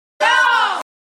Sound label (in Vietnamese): tieng do uong bia uong ruou giong nu